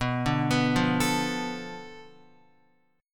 B-Minor 7th Flat 5th B down Guitar; Bm7b5; arpeggiated; JGuitar

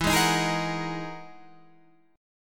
E Major Sharp 11th E, Guitar, Standard; EM#11 strummed; 12,11,13,13,11,14; JGuitar

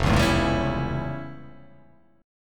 F Augmented Major 9th F

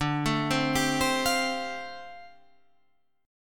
D Minor 11th D down, Dm11 chord; arpeggiated

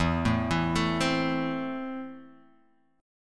E Suspended 4th Sharp 5th E, Guitar, DADGAD; 2,0,2,2,3,x; down

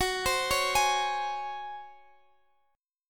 Db Major 7th Suspended 4th Gb

